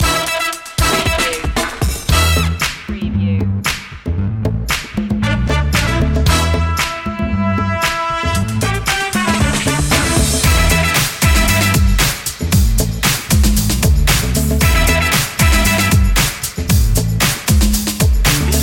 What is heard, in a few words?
shake n break no vocals